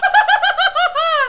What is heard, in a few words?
high